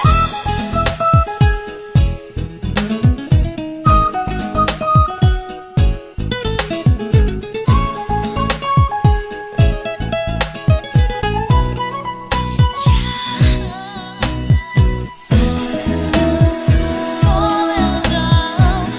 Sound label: Malayalam Romantic Guitar